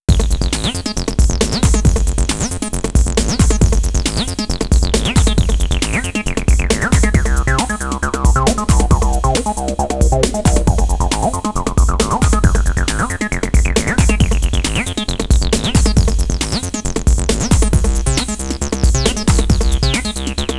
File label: Acid Pattern